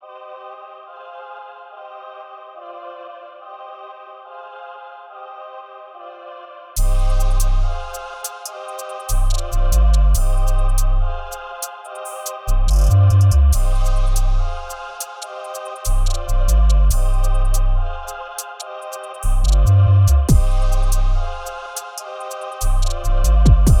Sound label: uk drill beats; Brixton